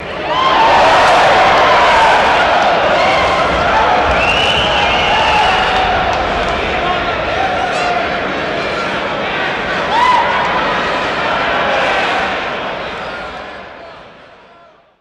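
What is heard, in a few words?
Crowd Cheering Short, big arena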